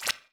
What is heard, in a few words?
head pickup